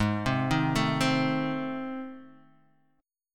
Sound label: A Minor Major 7th Flat 5th G sharp